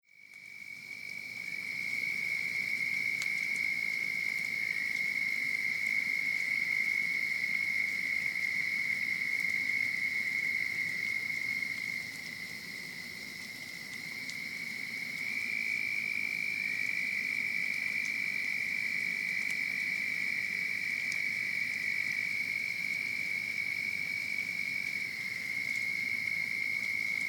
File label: Crickets Train Rain